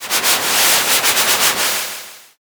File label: river